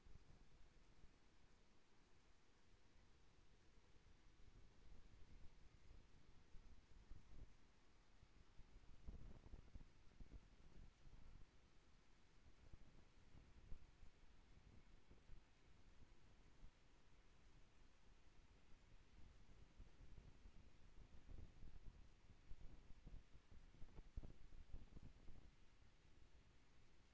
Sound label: HYDROPHONE 16000Hz; JASCO AMAR HYDROPHONE 740